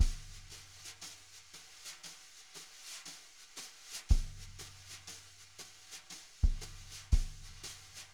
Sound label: jazz brush loops